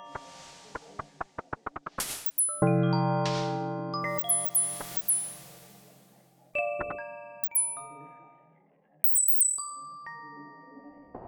tiny village; adarkroom

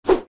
sword

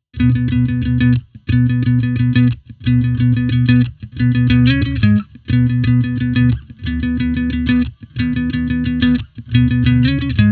ending; adarkroom

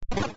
stoke fire; adarkroom

